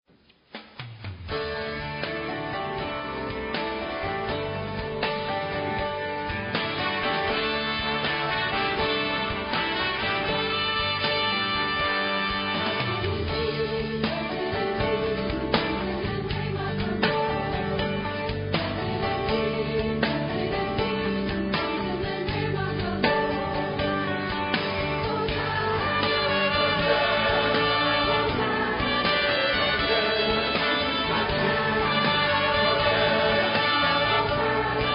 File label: choir